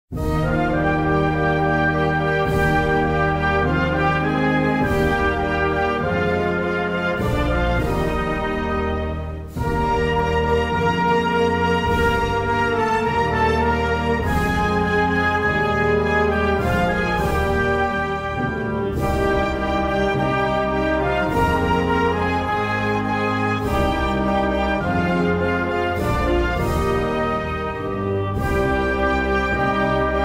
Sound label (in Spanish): Himno Nacional de la India